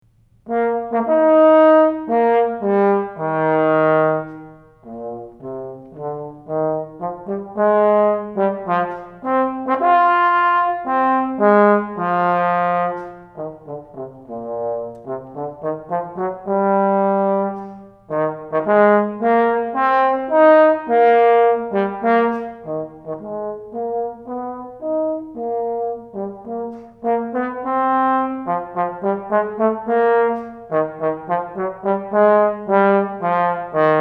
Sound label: Trombone Middle School